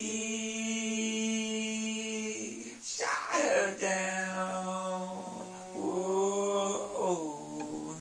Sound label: folksy back vocals